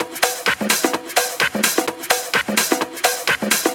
Percussive Loops 128BPM; Vengeance Samples

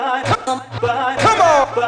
House Loops, Vocal Loops House 128BPM

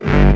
Offbeat Bass G; Vengeance Samples

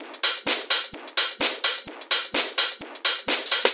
House Loop, 128 BPM; Vengeance Samples

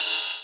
Cymbals Ride; Vengeance Samples; VEC3 Cymbals

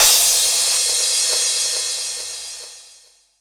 Crash, 128 BPM; Vengeance Samples; Vocal-Hook Kits; Song Kit

